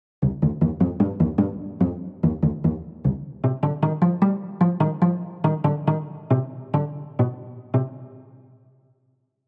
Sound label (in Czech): VCELO solo pizz